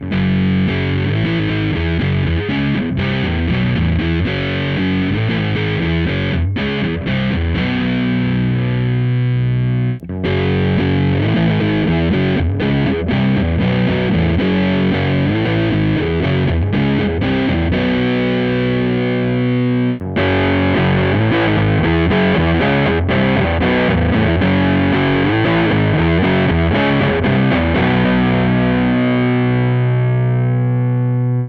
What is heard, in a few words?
rhythm bridge; 22/7; Ampeg Rocket II - mic - mixer - software reverb; (lead) pretty (neck pickup) - Gain 5:00, Tone 5:00, Bottom on (Cx=8n2)